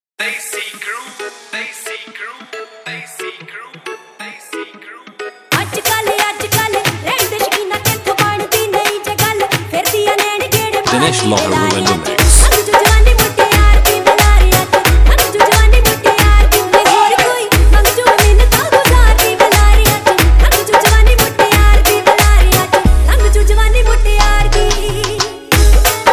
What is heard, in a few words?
Dhol Remix Punjabi Dj Song